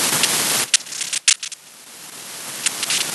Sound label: IETMS USB